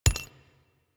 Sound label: Flak Cannon Shell Impact, weapons; Warframe; UT Flak Cannon